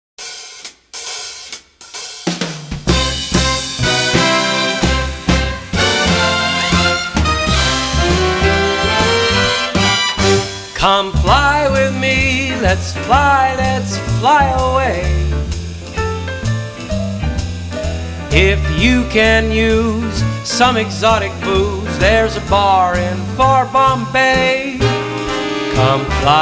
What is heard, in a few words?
Male vocal, Big Band